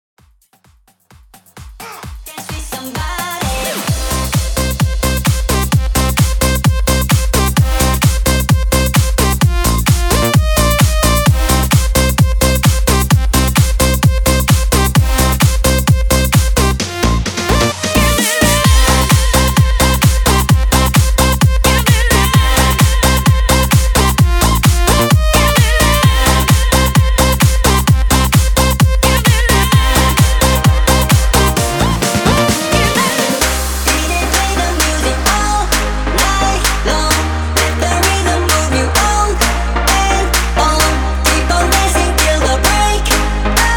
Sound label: EXTENDED 130 BPM; REDRUM